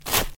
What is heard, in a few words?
Cutting Cabbage